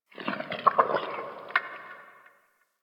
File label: Digest Interior Medium, Ambience